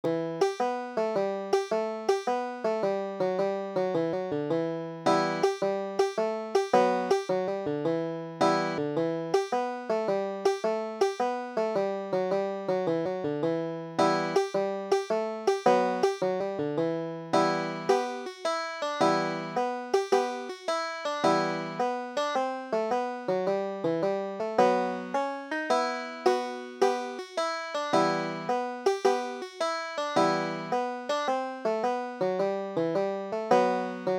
banjo

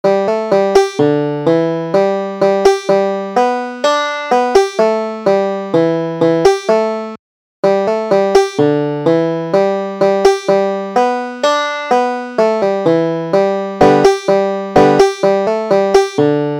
kellys goat, banjo

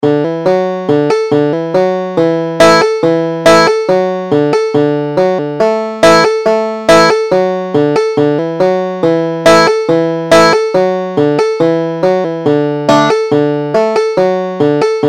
muddy water, banjo

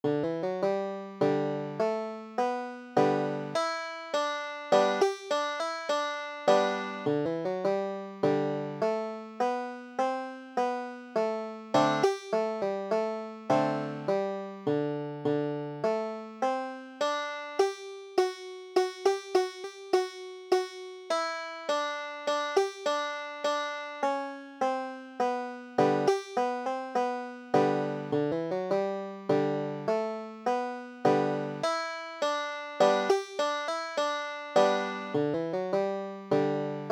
banjo

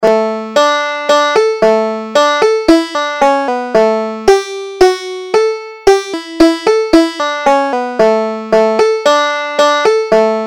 ricketts hornpipe

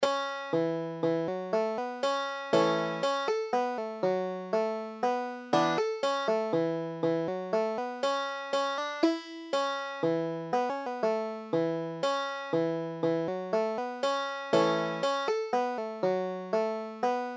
margarets waltz, banjo

